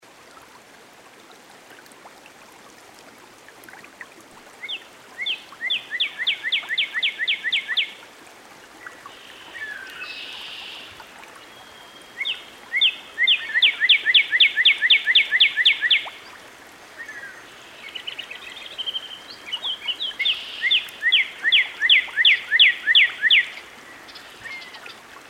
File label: Cardinal Two Different Songs; Dan Gibson; Songbirds By The Stream